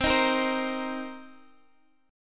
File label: C Minor 7th, Guitar; strummed; Cm7; 20,18,20,17,x,x; 4E,4A,5D,5G,5C,6F